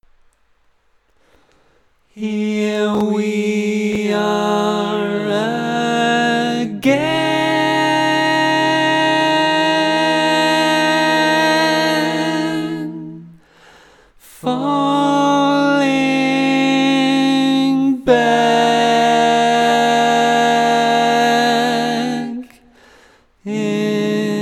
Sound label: Lead, barbershop